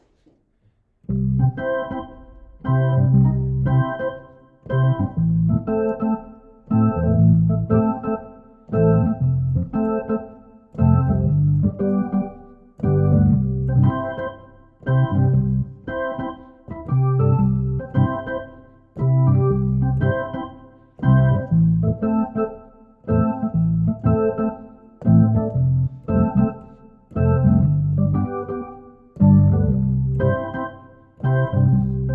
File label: reggae